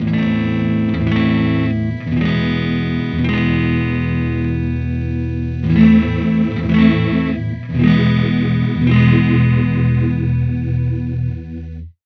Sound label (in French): effet chorus